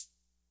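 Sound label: closed hi hat, univox; classic machines; rb-1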